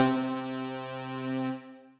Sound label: Sforzando; Retro Synths; Ableton; Opus